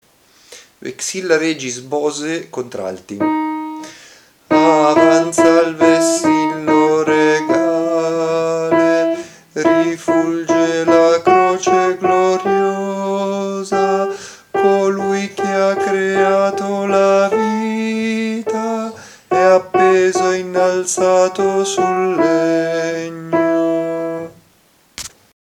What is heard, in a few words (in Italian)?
contralti; Vexilla regis Bose